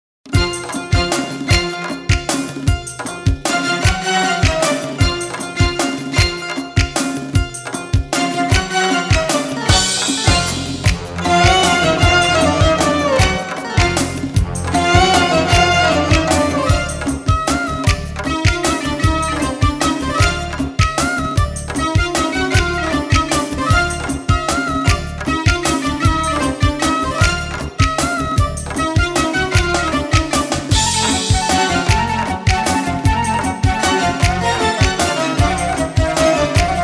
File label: Instrumental